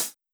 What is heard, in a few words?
soft hit normal; osu